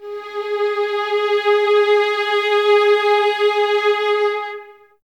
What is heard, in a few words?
G#4, 11 Violins, Soft; Miroslav Vitous